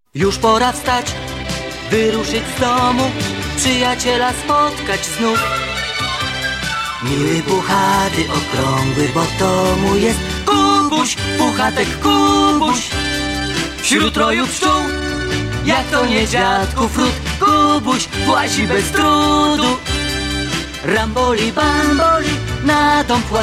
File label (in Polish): budzik sweet